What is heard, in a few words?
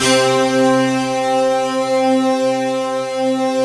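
fast attack fuzz, Fuzzy Stacks; Optical Media International; Sonic Images Library; Fuzztones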